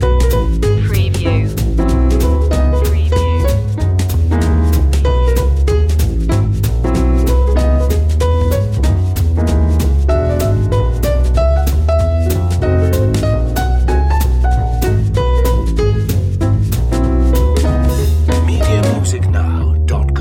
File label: tip tap loop